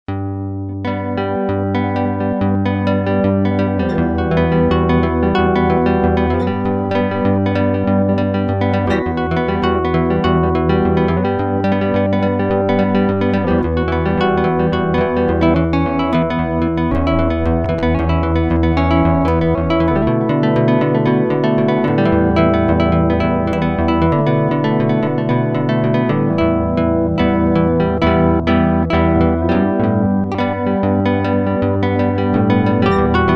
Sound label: guit neck